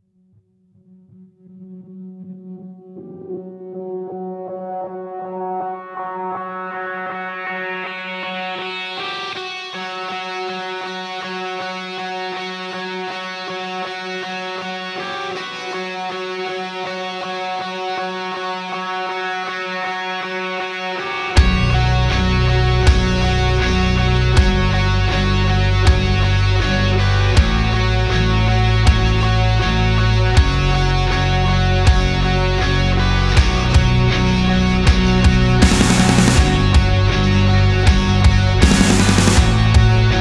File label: Instrumental